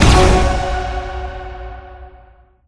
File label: Fuse Levelup; Spider-Man Unlimited gamerip 2014 android ios windows